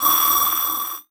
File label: Digital; Adobe; Multimedia Internet CD-Rom Flash